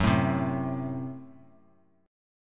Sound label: Gb Diminished, down, Bass Standard; strummed; JGuitar